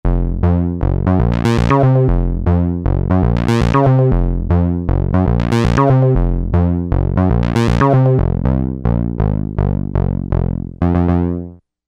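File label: PWM envelope Bass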